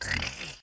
Sleeping; yoshi; sm64coopdx; char-select-extra-chars; [CS] Extra Characters

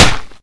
somen drop; HeavenStudioPlus; rhythmSomen

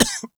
cough; HeavenStudioPlus; forkLifter

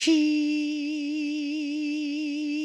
roll B; HeavenStudioPlus; board Meeting

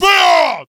yell; HeavenStudioPlus; ringside

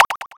spawn; Shoot Em Up

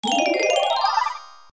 ranking superb; Heaven Studio Plus

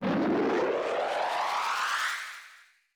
jet Start; HeavenStudioPlus; spaceSoccer

